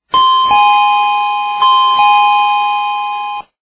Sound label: dingdong doorbell; doorbell ic